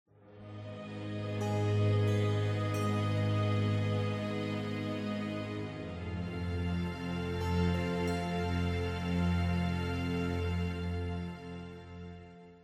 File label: Music Success; The Beast Inside; PS4; Xbox Series X|S